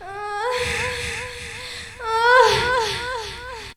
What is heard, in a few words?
SEX; Zero G; Man Machine